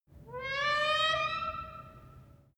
wood door old creak high soft

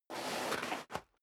step sneaker gravel scuff

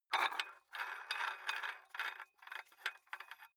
dumbbell open nut thread, fitnessstudio

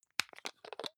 hygiene small screw cup open medium; haus-garten; badezimmer

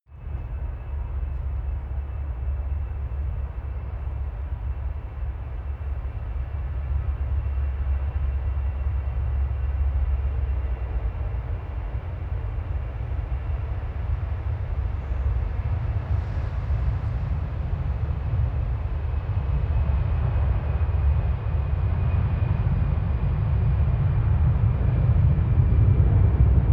plane flyby land rumble

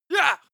male attack shout short; schreie; menschen

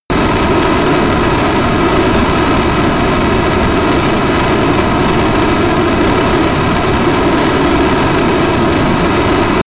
military jet b-1b lancer turbine idle close; Airplanes - Jets

